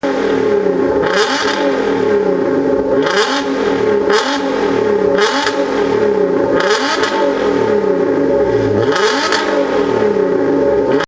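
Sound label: 156 gta titan cool, Alfa Romeo; unicorse-italial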